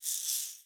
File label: Shaker Shake